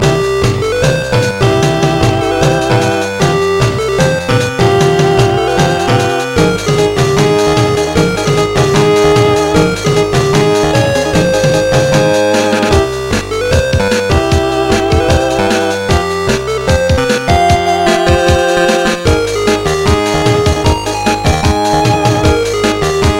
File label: Bass